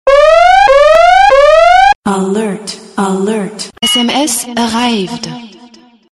virus sms

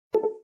notification

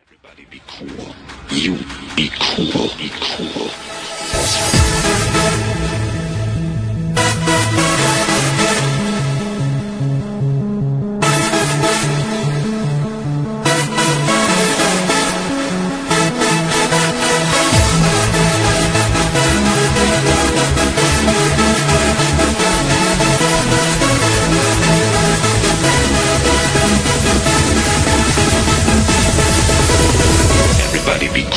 techno mix

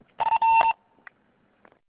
Fire Tone; Newington

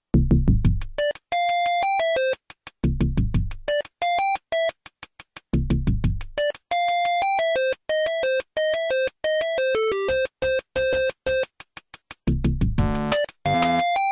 8 bit

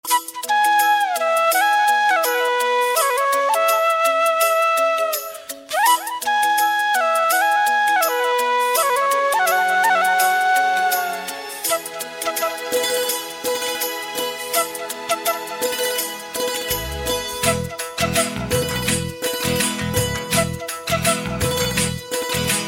flute coolest

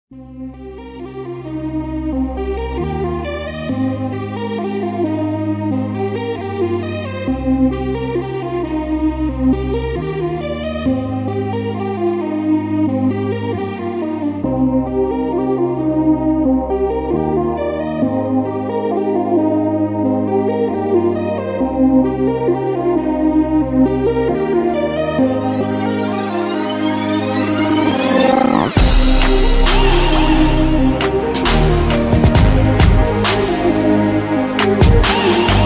instrumental

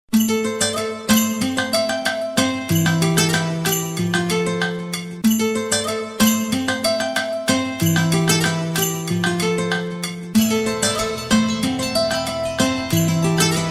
melody tune; ringtones

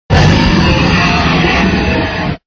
Tyrannosaurus

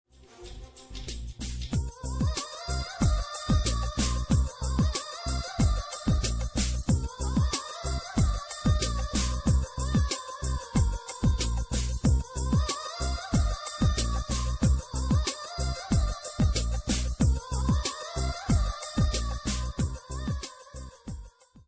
indian movie ring tone

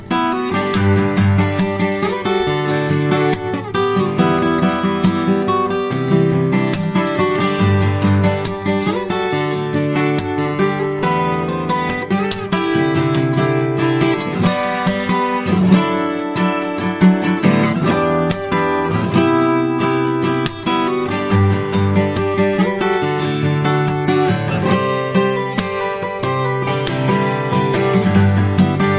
Guitar

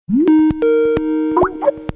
short notification

